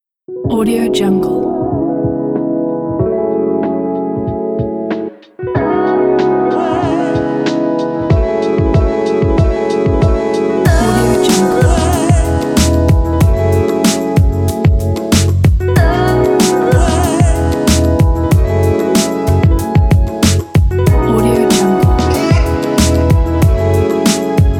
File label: lounge hiphop beat